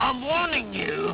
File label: warning